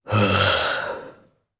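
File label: Vox Sigh, Japanese; Riheet; kingsraid audio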